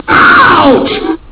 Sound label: ouch